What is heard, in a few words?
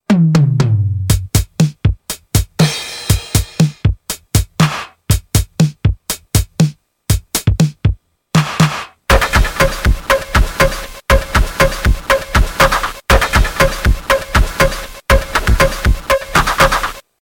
drum beat sounds